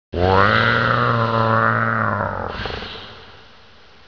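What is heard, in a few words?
die, alien controller; floater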